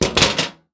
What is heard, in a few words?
toolbox drop; Items